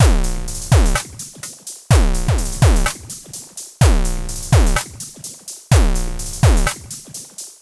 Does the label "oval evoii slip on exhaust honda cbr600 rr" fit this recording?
No